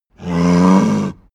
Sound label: cow moo single weak